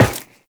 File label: Run Metal, Footsteps; Warframe; Tenno